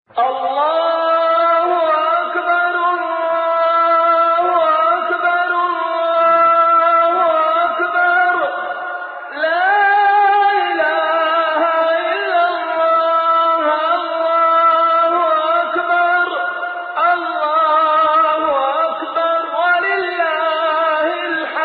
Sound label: Takbeer-E-Tashreeq